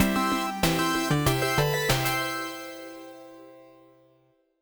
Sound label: game end